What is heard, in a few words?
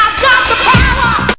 power